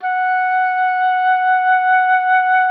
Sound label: F#5, OBOE; Xsample VOL-6